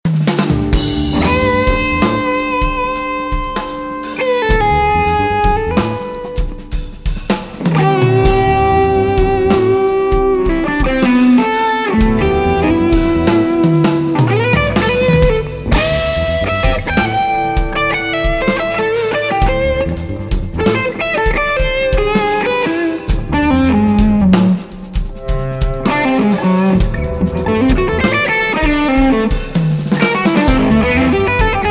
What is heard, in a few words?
Sco Fusion Groove; SLX50